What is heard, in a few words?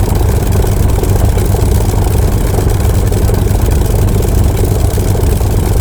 Aircrafts Engines Packard Merlin Prop; DCS